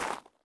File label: zombie footstep; DeadFright